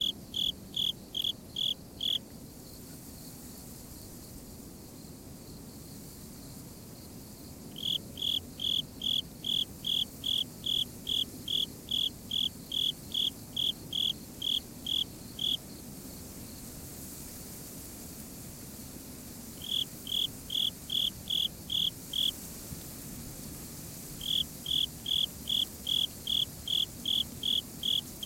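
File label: Emma field cricket; tuduresasekoorogi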